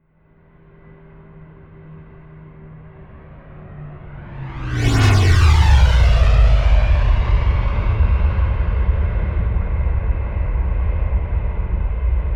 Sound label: plane bombardier learjet flyby left to right close